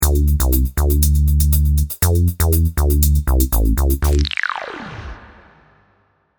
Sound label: extreme dance energy, stingers